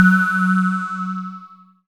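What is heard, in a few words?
synth, MicroWave; Sound & Vision - Gigapack I CD 2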